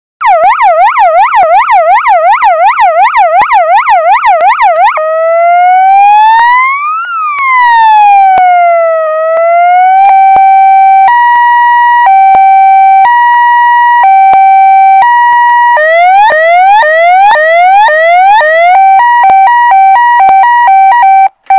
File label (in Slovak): sirena 12v 100w 3 tony s riadiacou jednotkou (vystrazny system)